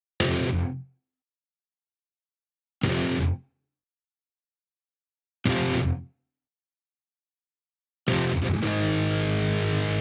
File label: Guitars II; risk of rain 2 engineer edition 2